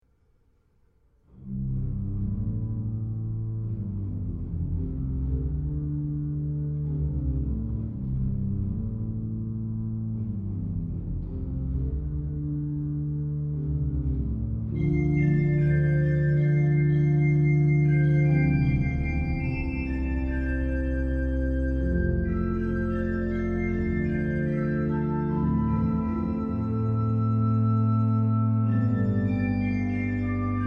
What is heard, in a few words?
Folk